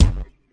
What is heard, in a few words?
fx drum